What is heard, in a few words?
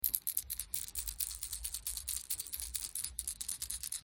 Round Flat Rattle 15mm, insert for soft toys